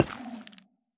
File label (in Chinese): 幽匿催发体踩踏声